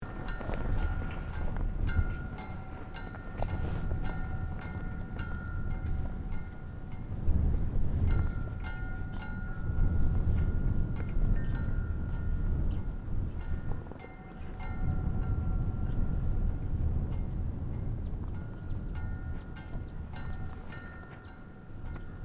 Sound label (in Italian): Bandiere al vento